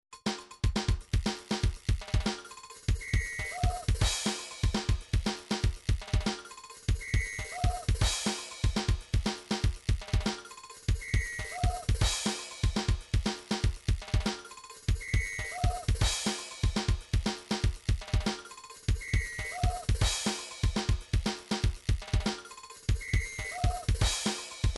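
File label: drums pattern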